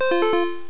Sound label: acquire